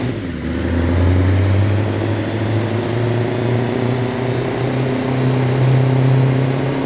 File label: third, vehicles; bus